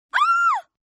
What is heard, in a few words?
Scream Female Short; Screaming; Sound Effects:Voice